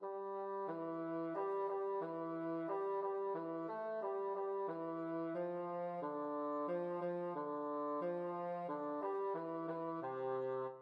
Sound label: rain rain, bassoon